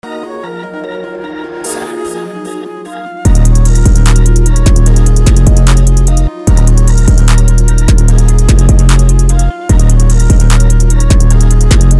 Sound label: 149bpm